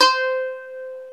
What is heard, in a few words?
SAZ C5, Plucks; Eth Plucks, Pluck Duo